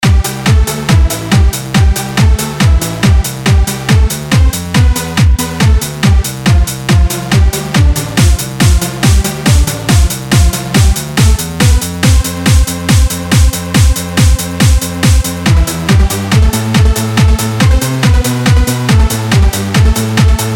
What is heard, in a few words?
Techno Mix